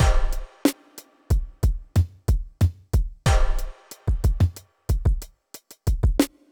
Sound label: deep beat